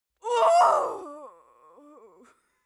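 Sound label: female death, screams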